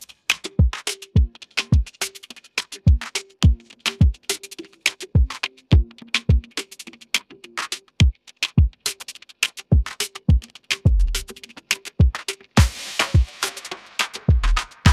ringtone afrobeats

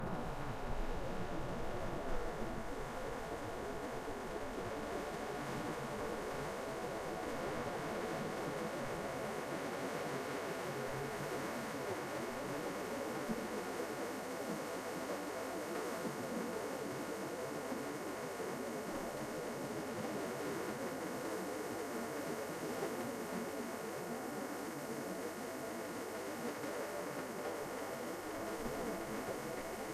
ambient nature

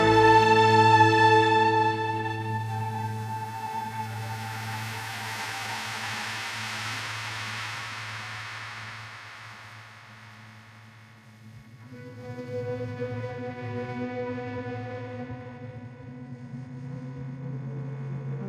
ringtone cinematic

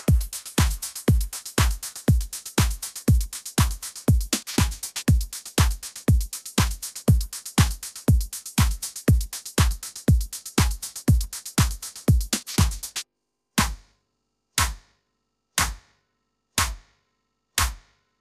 ringtone edm

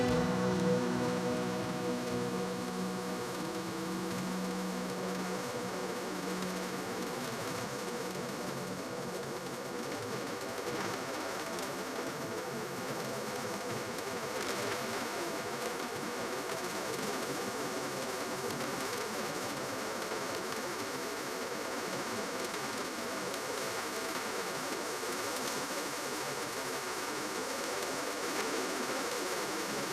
ambient nature

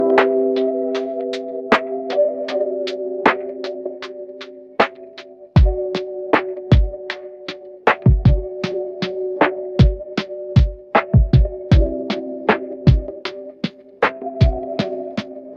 ringtone lofi

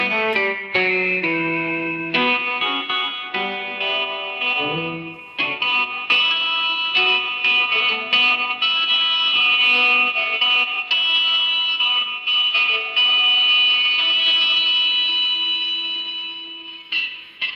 ringtone country

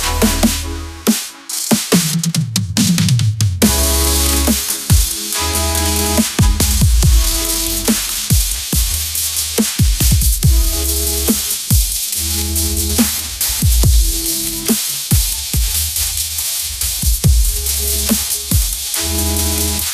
ringtone edm

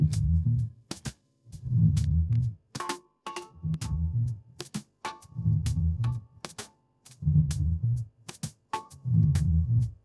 ringtone hiphop

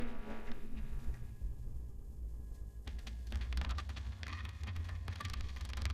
funny

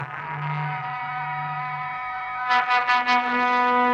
funny

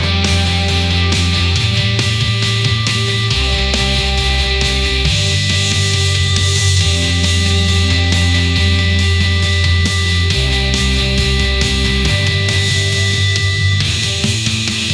ringtone rock

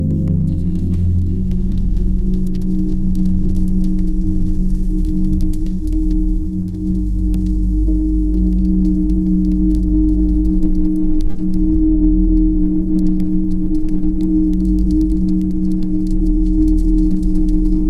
ambient nature

